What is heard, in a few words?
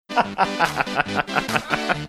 laughing